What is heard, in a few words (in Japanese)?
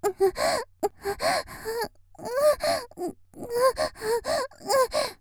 「喘ぎ」無理矢理されて嫌がる; 5秒; 綾波系; 少女